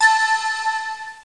Sound open; Paris Virtual Tourism

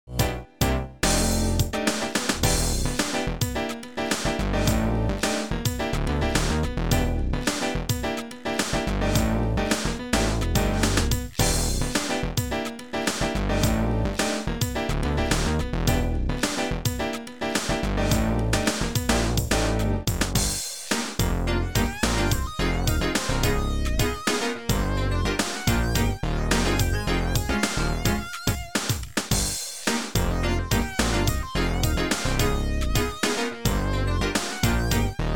funkanette, soft jazzfunk; Digital Symphony Collection

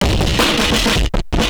DRUMLOOP D+B 160, Drum+Bass Loop; eJay

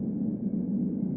AMB

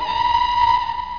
opera

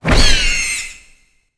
eagle hurt

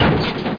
Clunk

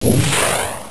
bullet; The Rage

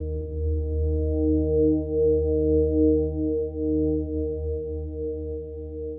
sphere ambient 160

